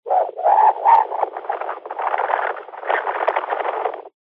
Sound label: technology cb radio wind blowing receiver speaker pov